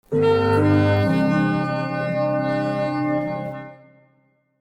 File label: sad strings